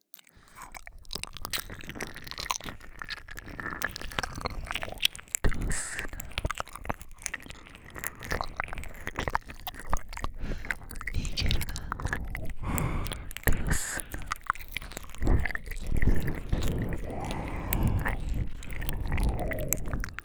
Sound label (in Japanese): 耳舐め囁き 逃げるな×出すな